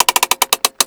Bomb Priming; items; mohaa

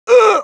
damage, pain; mohaa; dialogue

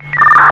whistle; cdogs

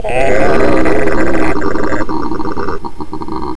die; xash3d fwgs; controller

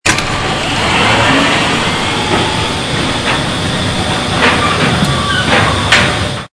Electric Cart Start; vehicle; mohaa

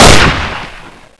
p228 fire, weapons; xash3d fwgs

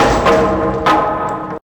rocks; scummvm; The Griffon Legend

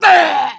death; mohaa; dialogue

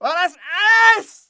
hit, pain; mohaa

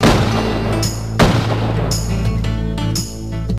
death; singe

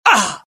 death, pain; mohaa; dialogue

